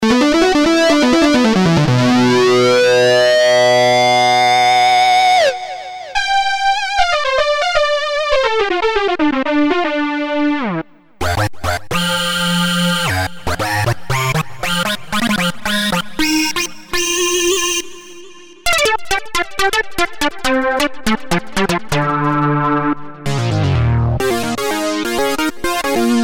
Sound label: Leads; dinopark